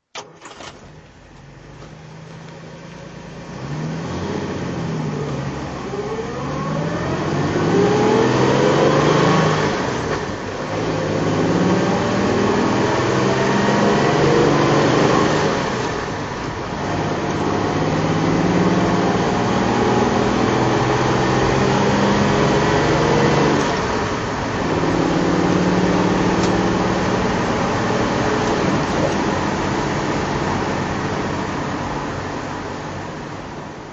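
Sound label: Jaguar mk2 drive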